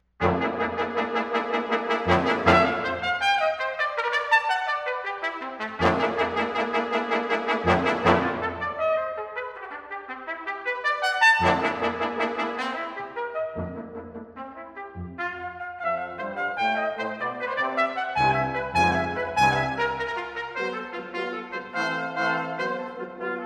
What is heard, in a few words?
Concertino for Brass Choir - Mvt. 3. Very Fast